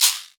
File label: sharp shaker sound a key; percussion